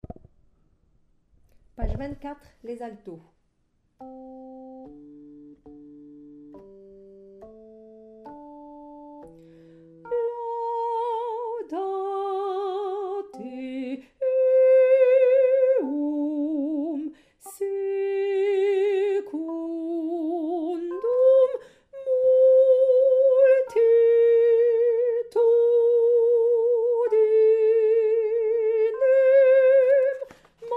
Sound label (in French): psaume Alto